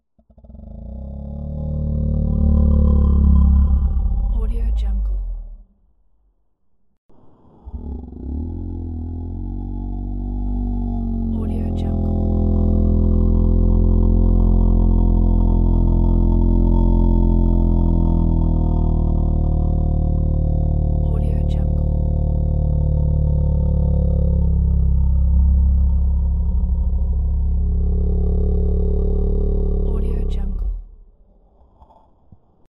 dinosaur breathing; human sounds

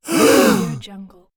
surprised small group gasp; cartoon

